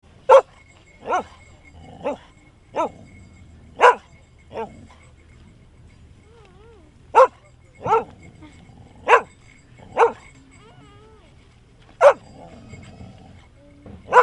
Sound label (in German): mittelgrosser hund bellen